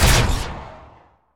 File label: Sci-Fi Weapons Machine Gun Single Shot